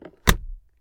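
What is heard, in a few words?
close car glove box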